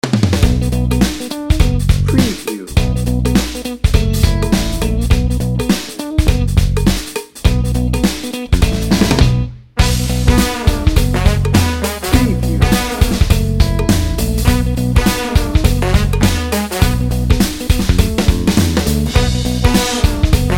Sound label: funk n brass